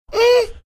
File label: "mmh"